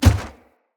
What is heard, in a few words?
Heavy Grunt Boot Walk Gravel, Footsteps; Enemy; Sinecure